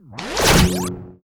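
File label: war attack, Mob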